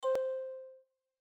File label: bleep